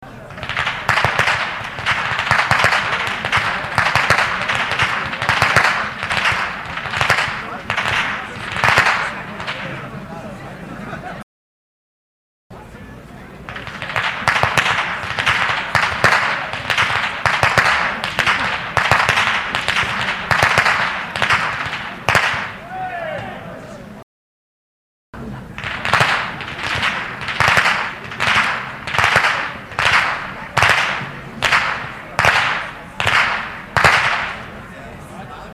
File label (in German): Schnalzen; Ainring; Ainringer Schnalzen